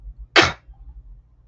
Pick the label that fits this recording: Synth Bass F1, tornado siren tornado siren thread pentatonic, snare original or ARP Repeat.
snare original